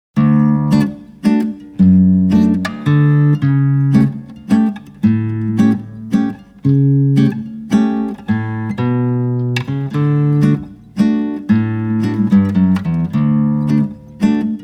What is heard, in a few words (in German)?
Walzer Landler Walzer Playback ohne Melodie